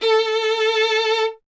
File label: STR VLN, Vln Detache; Miroslav Vitous